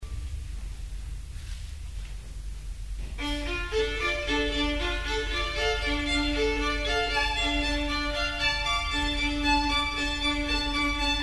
Vivaldi concerto grosso d moll Allegro Molto Adagio Allegro; op 3 12